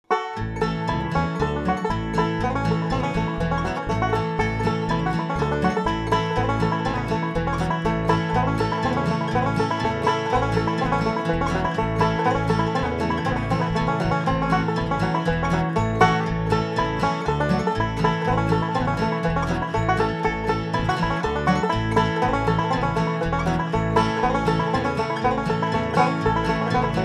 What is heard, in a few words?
Cripple Creek - Bluegrass Version, banjo